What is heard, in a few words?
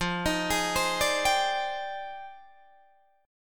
F Minor 13th, Guitar Drop D